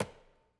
lumber tree

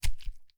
flesh stab, attack impact

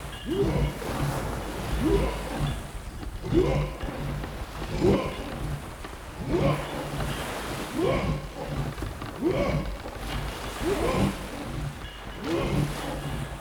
warship movement loop; ships siege

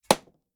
arrow, attack impact

